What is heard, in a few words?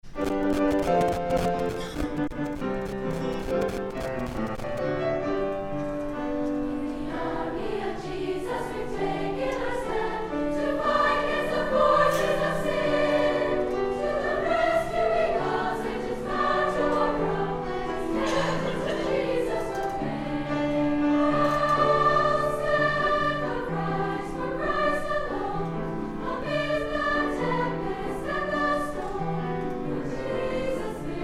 Vocal; Ill Stand for Christ; Vocal School